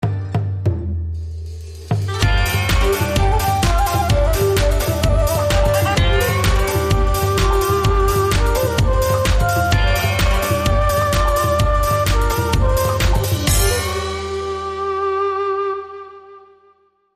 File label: Techno Asian Fusion